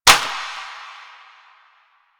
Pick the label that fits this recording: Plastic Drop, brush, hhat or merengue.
Plastic Drop